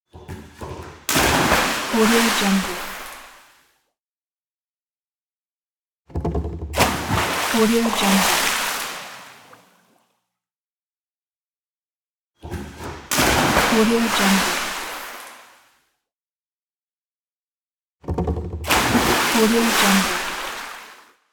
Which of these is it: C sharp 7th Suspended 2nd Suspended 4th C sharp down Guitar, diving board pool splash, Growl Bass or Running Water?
diving board pool splash